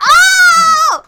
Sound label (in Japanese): あああああ！